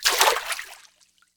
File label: Water Walk